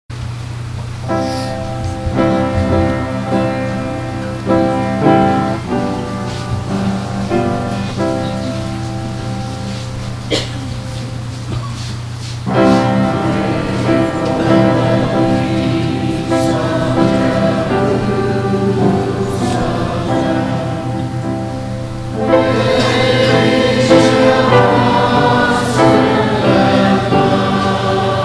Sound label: Anthem